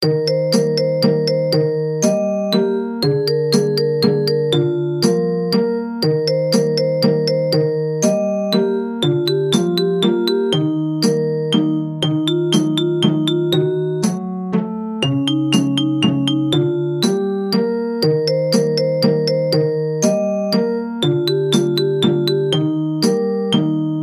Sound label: bgm; mori